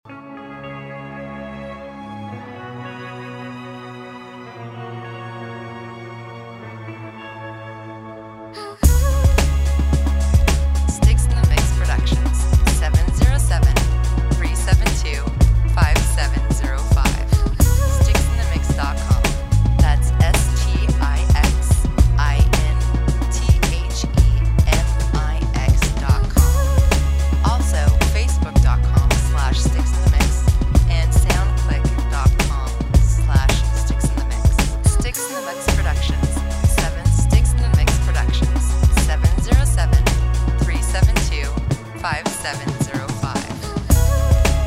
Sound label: Guitar strings